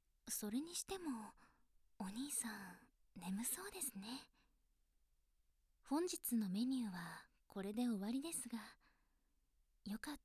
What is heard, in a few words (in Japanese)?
添い寝, 効果音なし